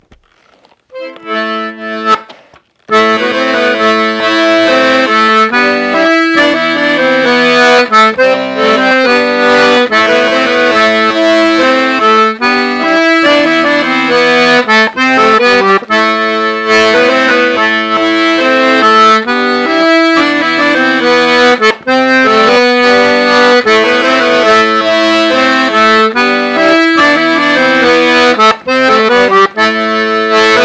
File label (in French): St Patrick Andro Theme Diato